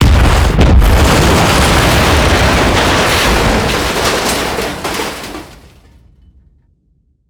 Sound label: helicopter explosion; Rust